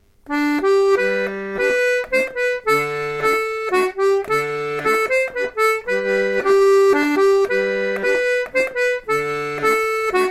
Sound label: mazurka; Accordéon Diatonique